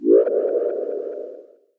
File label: SFX